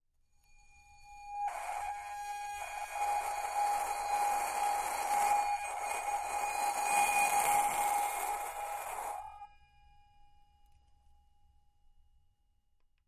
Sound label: Brass Bowls Bowed, singing bowls